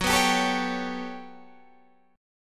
G Major Sharp 11th F sharp, chord

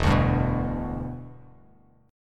E Minor 7th Bass Standard; strummed

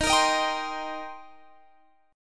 C Minor 7th D sharp

